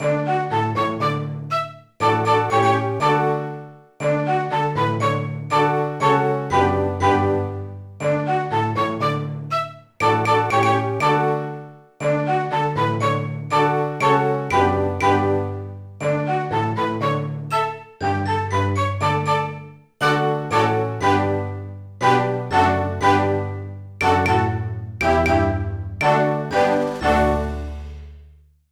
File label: Stereo Classical